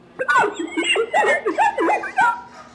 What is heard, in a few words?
Distorted